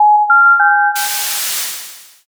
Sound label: modem